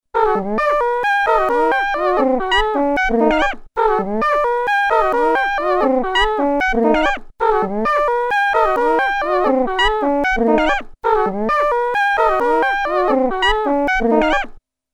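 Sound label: Cortex tr, prepared rhodes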